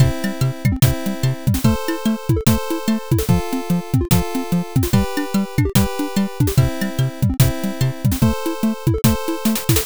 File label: bgm